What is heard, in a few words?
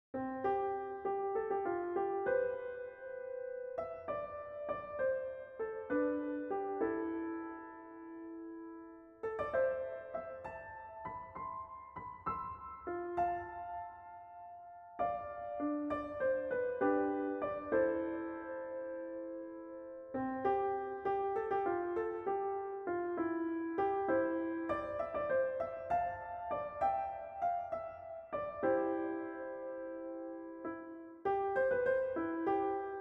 Kalimba